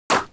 body, fleshhit

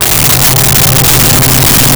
Cello C3; Nintendo; July 2020 Gigaleak

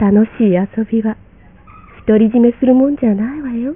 cat voice act; SE; Nintendo; July 2020 Gigaleak

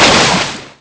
shot

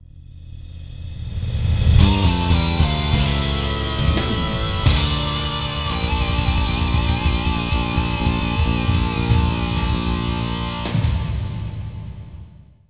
game over; Nintendo

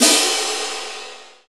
Cymbal c4; BGM; Nintendo; July 2020 Gigaleak

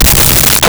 Fretless C3; Nintendo; July 2020 Gigaleak

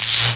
spark; Nintendo; July 2020 Gigaleak

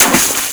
water shock; Nintendo; July 2020 Gigaleak

